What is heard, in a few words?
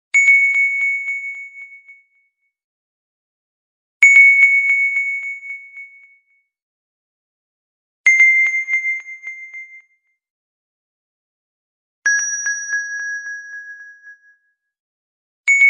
softpings